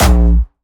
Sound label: A#1, Jumpstyle Kick; vengeance essential club sounds-3; VEC3 Bassdrums